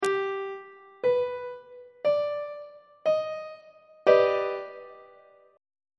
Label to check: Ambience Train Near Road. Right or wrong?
Wrong